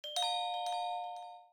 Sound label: illumine, notifications; OnePlus 5T Stock Ringtones